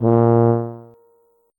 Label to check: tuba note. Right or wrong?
Right